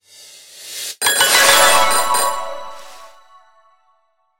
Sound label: Message